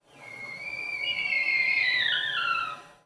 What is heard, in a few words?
bomb